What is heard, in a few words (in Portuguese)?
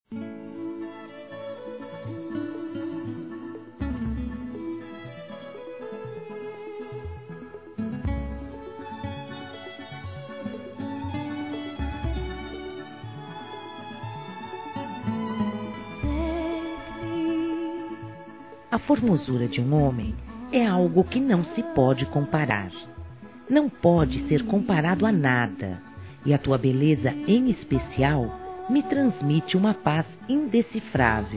Chance Para Nós Dois, FEM, Telemensagens; Conquistas Cantadas paqueras; Telemensagens 2017